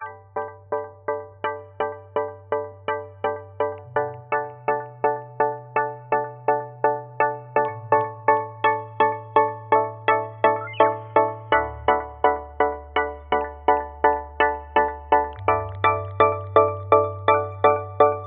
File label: alarm, ringtones; sound of oo website ringtones